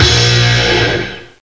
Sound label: Blow; The Amsterdam Marihuana Guide